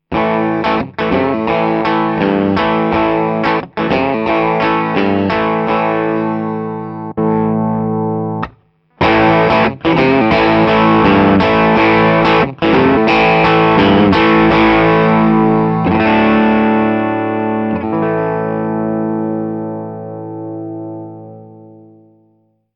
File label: bluguitar amp1